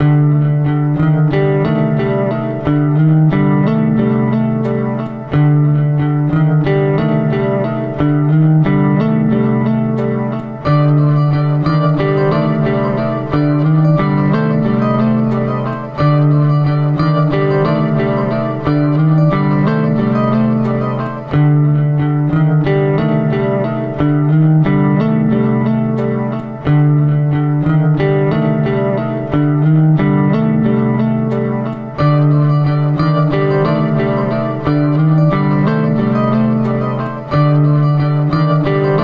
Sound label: Loop